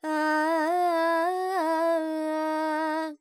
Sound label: Charukesi, Vocals, Carnatic Female, Alaps, G, Dry